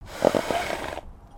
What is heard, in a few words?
horse snort